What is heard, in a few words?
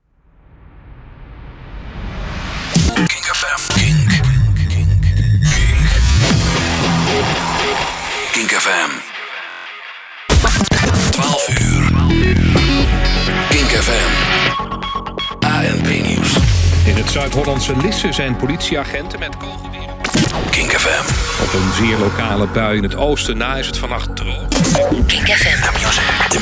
jingle; kinkfm 2010